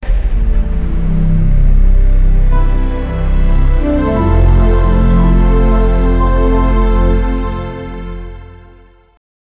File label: dramatic entry, intro